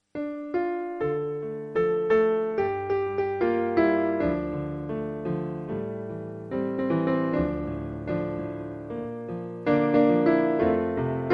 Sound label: piano and string start